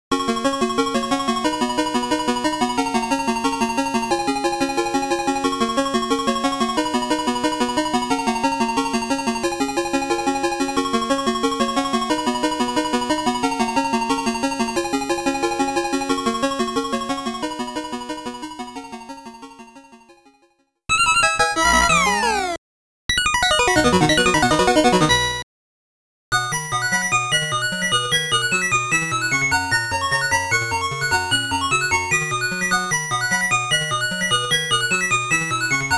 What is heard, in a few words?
Bonus Stage BGM Game Over Name Register, arcade; Sega Arcade 80s Vol. 1